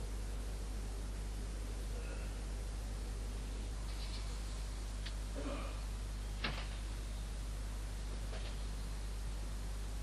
window close

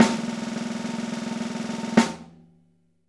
Drm Rll

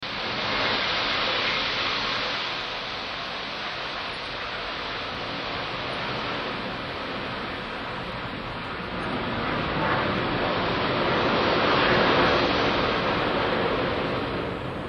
air noise

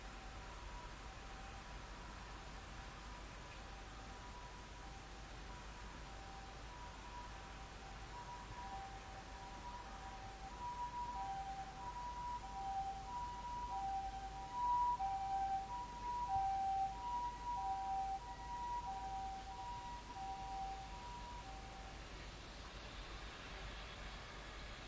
siren

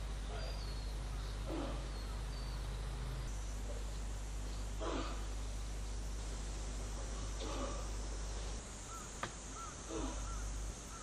window; in-home-window

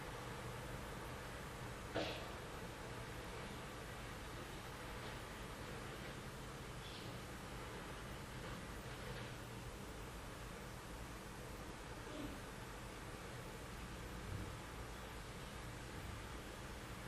siren; fake-shick-siren

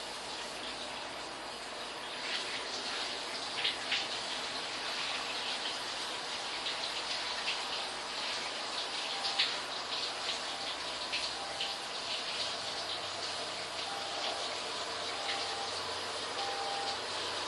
bath siren short middle

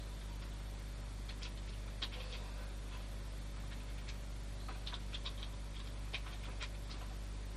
car parking

